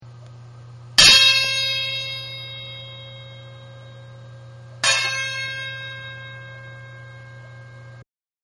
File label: tone ring, banjo